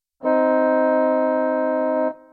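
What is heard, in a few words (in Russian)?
орган интервал 8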